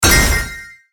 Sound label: Crash Special Box; Cookie Run; Chili